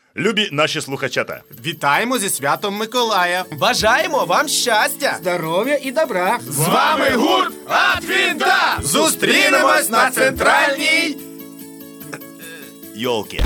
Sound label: Jingle Bells, Na ukrainskom